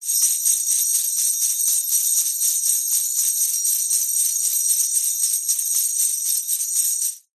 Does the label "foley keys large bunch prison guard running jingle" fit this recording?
Yes